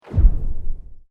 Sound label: Sweep Mechanical Medium; Created sweeps, swooshes and other movement effects; Sweepers; Foundation4